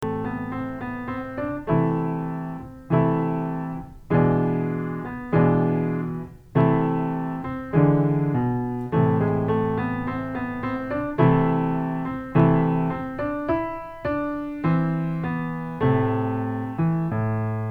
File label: Special Music Piano Solo